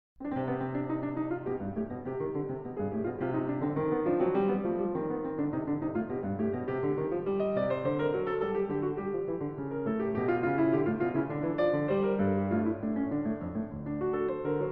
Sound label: Invention In C Minor